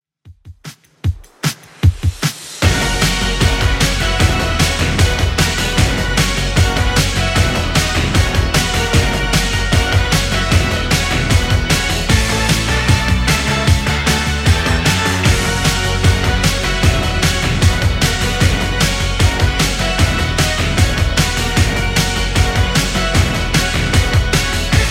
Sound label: 80s Redrum 152bpm